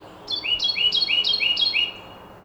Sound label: Carolina Wren; ibm glen